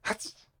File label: Mosskin Attack; Mosskin Attack 03